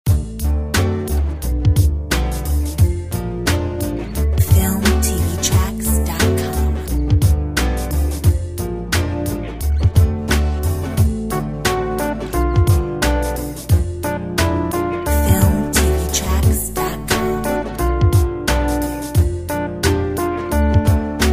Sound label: Quirky song